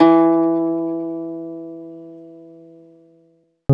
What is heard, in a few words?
ZITHER; Alpine Volksmusik